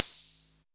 Ride, Cymbals; Vengeance Samples

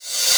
Sweeps Short

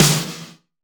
Snare; Vengeance Samples

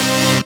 Synth Shot; Vengeance Samples

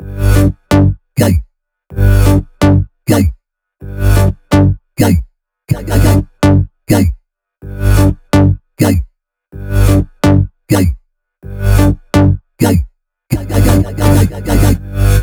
126BPM Melody; Cubic Kit; Vengeance Samples

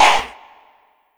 Chant

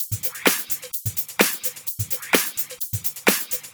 Minimal Loops

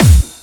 Bassdrums Clubby Kicks; Vengeance Samples

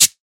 Percussion; Vengeance Samples; VEH2

